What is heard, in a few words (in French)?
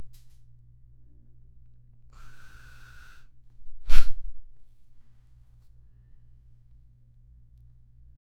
fleur qui ouvre, blossom